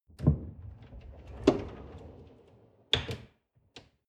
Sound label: folding door open long